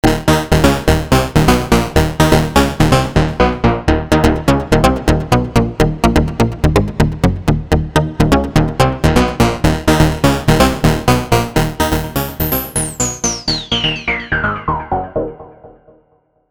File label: Hard Keys; Minimax; dinopark